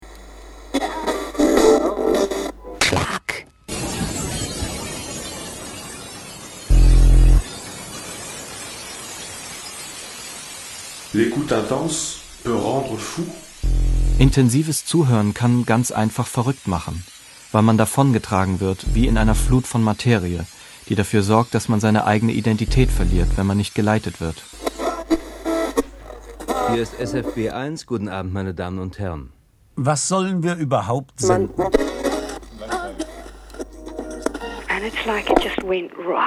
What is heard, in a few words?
radiophonic spaces